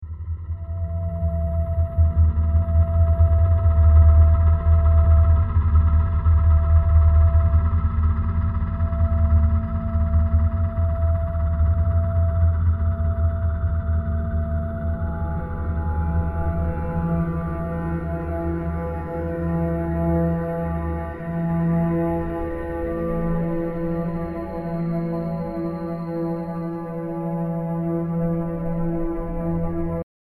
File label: Moth Drone